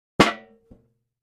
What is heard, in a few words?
Aluminum Pan Buckle; barrels buckets & cans